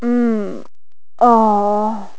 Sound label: moan